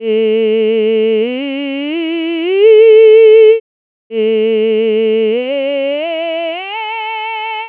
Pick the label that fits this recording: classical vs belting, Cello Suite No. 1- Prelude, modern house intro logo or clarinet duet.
classical vs belting